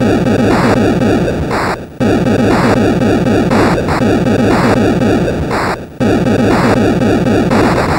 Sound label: Atari 2600 Noise Drums